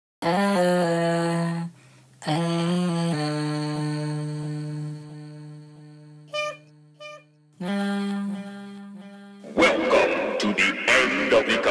vocals

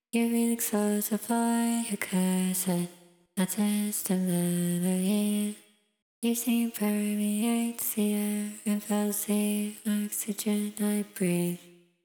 breath vox

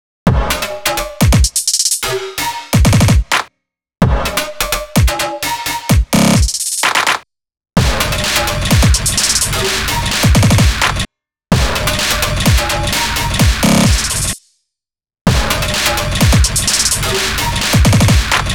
128bpm Drums

